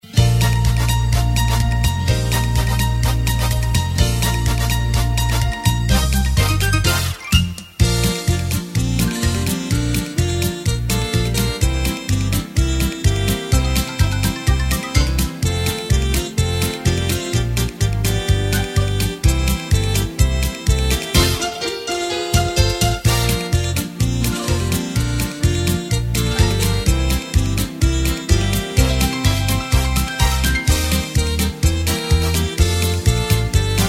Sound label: music